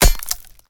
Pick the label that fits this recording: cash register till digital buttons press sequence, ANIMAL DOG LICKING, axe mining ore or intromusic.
axe mining ore